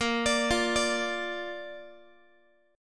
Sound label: A sharp Major, Guitar; arpeggiated; tuning 4A,4E,5A,5D,5G#,6C#